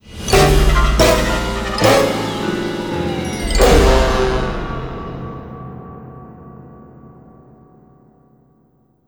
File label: Lose Music; Fat Princess; ps3